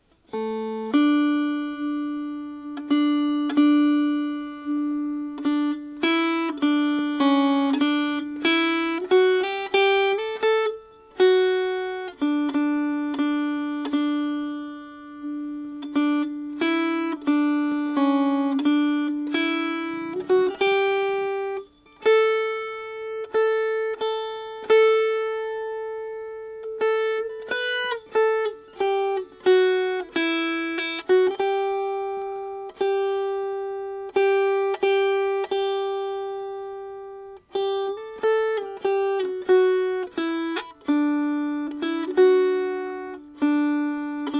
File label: Melody